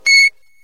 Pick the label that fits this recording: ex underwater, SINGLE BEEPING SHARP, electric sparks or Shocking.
SINGLE BEEPING SHARP